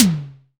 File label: acoustic tom drum g key; tom drum samples